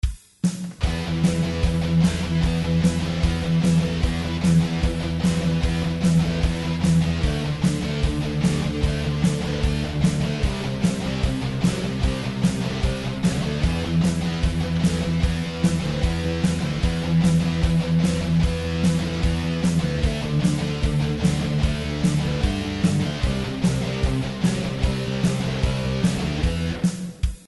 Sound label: 5ths Neighbor Tones